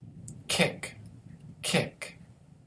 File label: kick